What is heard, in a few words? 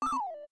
Slide close; KF755 Secret; LG